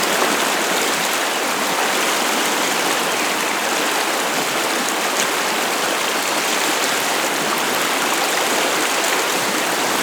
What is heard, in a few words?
Waterfall Large Loop; Ambience; Props; Warframe; Eidolon Plains